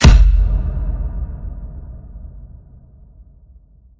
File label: Clean Hit; Home Run